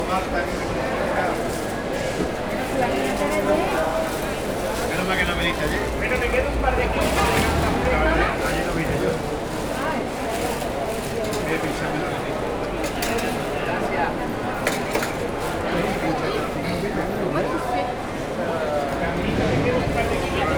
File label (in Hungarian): bevásárlócsarnok hangulat